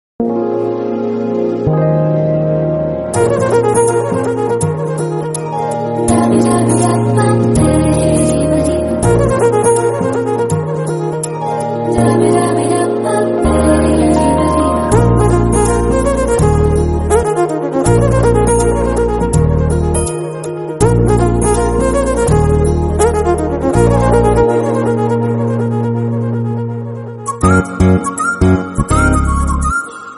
climax bgm instrumental; radhe shyam

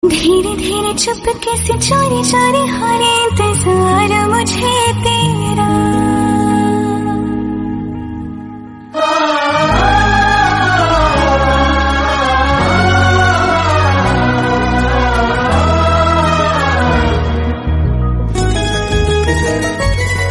hindi song

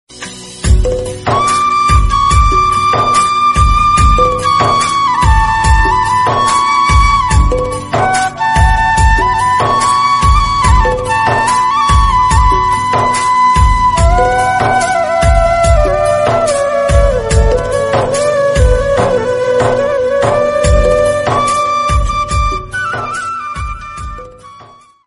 sad flute instrumental; cg song

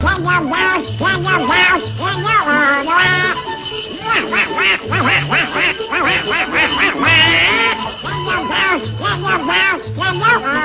jingle bells

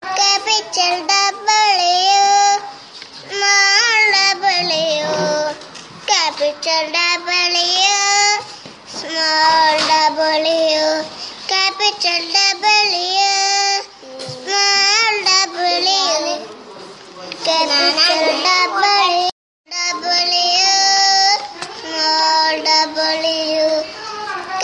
funny song

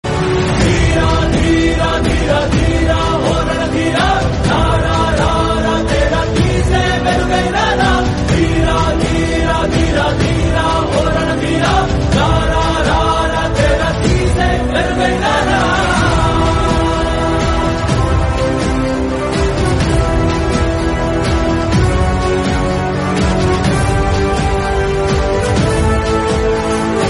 malayalam song

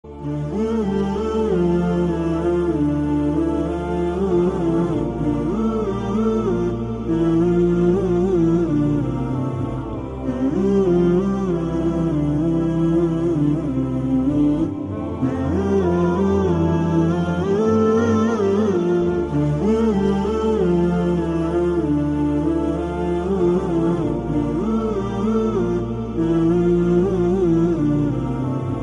humming nasheed

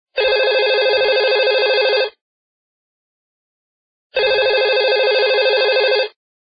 old cellular sound

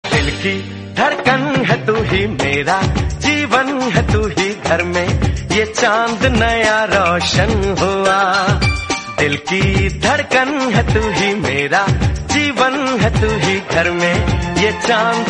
hindi

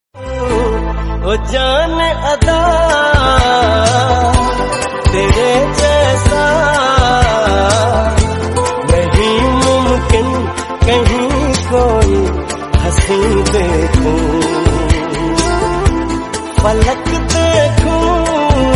hindi lofi mix

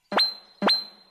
anime hair twitch

